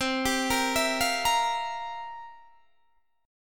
C 11th, C, down, Guitar, DADGAD

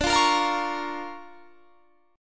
D Minor Major 7th Sharp 5th, D

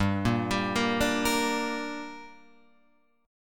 G Minor G down Guitar Open F, chord; arpeggiated; JGuitar

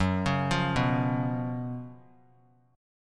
F sharp Major 7th Suspended 4th; F#M7sus4 arpeggiated

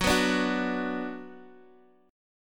F sharp Suspended 4th Sharp 5th, Guitar, Standard; strummed; 14,14,12,11,x,x; down

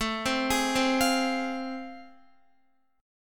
A Minor Major 7th Sharp 5th A, Guitar; AmM7#5 arpeggiated; x,12,12,13,12,13; 4F,4A,5C,5G,5C,6E

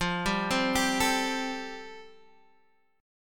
G 9th Suspended 4th

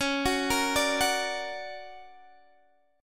D Augmented Major 7th C sharp, guitar

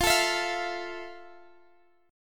E sharp Major 7th; strummed

